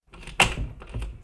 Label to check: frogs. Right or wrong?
Wrong